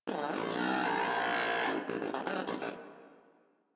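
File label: Moa Aggressive Vocal Player Down; Warframe; Pets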